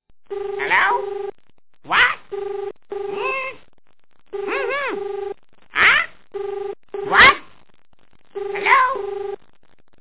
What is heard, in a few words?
Polly Voice